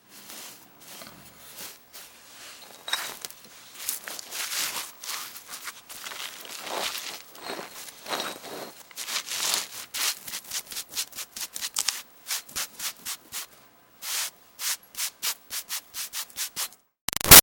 Foley Moves Dirt Gritty Smear; Debris; Materials; Debris Sound Effects

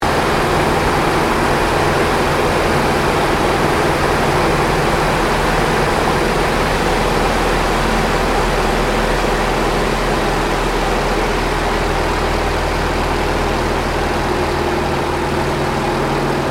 AIRCRAFT T-28D TROJAN FLYING INTERIOR; Airplane; Vehicles

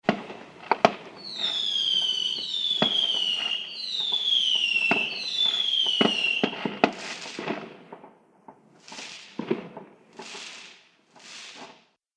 Fireworks Bangs Whistles Crackles

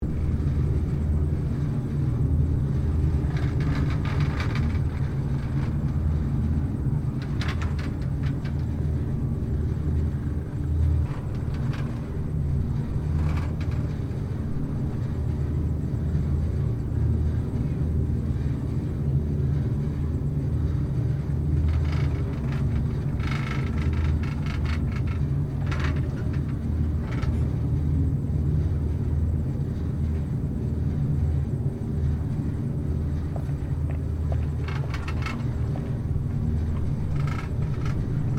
Chairlift return wheel loop, Chairlift operating; Skiing And Snowboarding Sound Effects

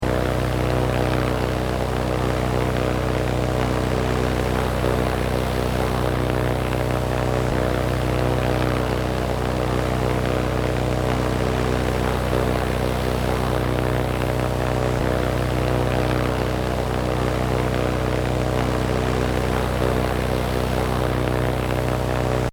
Airplane Cessna Idle Loop; A Cessna propeller airplane motor idling, seamlessly looping sound; Airplane Sound Effects; Lynne Music

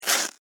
Office Envelope Manila Tear Open Short; Paper (Sound Effects:Offices)

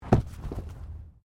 Body movement Fall Medium

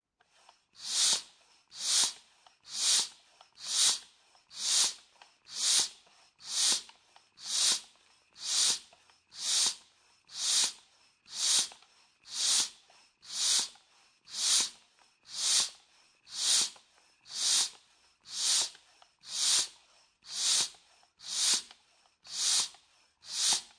Foley, Air Pump, Bike Pump, Manually Pumping; Air Pumps

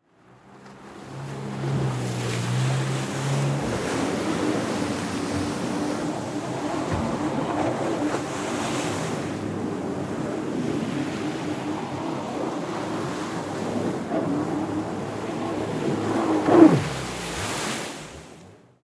Jet Ski Activity, Watercraft, Ride, In, Circles; Transportation; Boat Sound Effects

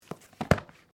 Book Place; Book movement; Offices

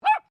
Animal Dog Poodle Miniature Bark; Various domestic dogs; Animals

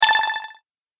Score Hit Game, Video Game Sound Effects

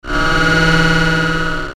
DIGITAL BRIGHT, Digital Bright Rough Swell; glitches; Sound Design; Blastwave

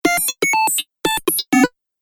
Digital Data Sequence, Sci Fi Music

